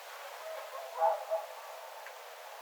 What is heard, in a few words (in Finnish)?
itaisempien laulujoutsenten aanityyppeja